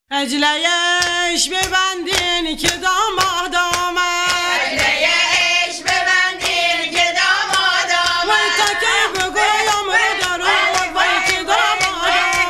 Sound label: Azadari Zanane, مرثیه